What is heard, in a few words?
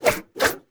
BOSS Atk